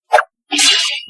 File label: slash